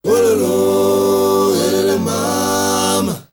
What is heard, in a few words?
MALE GRP, M. CHOIRS, Heart of Africa